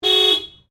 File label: CAR SUBARU LIBERTY HORN LONG; Subaru Liberty 20 01; Family members Subaru; Vehicles